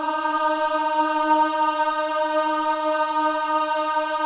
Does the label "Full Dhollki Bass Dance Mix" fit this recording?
No